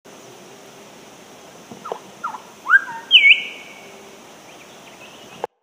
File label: Grey Thrike-thrush